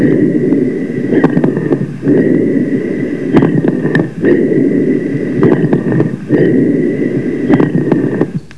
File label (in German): Atmen, Lunge